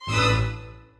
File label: Scary Psycho Monster A2